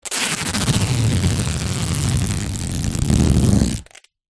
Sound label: office sticky tape rip roll long strip; zapsplat